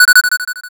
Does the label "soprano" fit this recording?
No